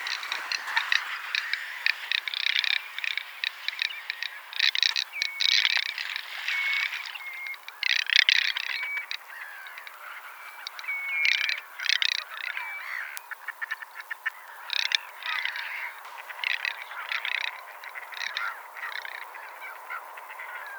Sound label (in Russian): chirok treskunok golos